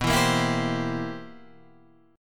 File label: C 7th B, C7/B chord; strummed; JGuitar